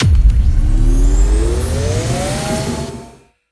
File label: near Win; mercy of the gods